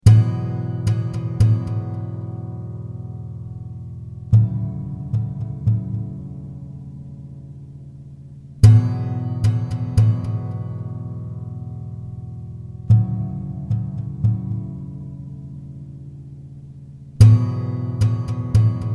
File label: E112 2 chords delay